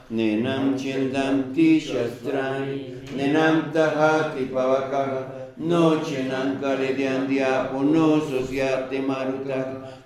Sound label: Nenam Chetan mantra; mantren